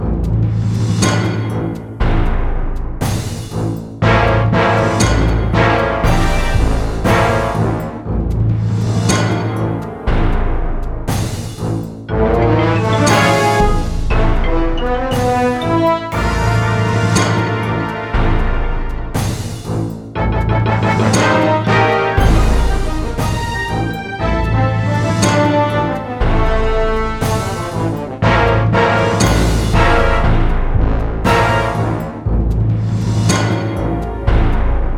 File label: Final Boss Phase2 Loop; Sparklite